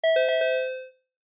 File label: Quiz Correct Answer